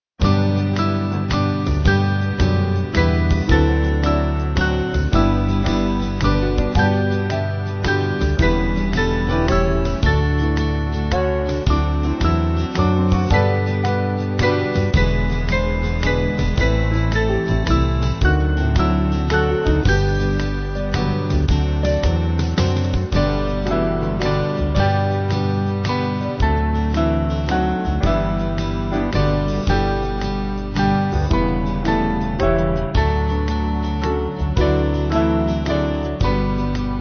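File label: Band, Music Backing Files for Churches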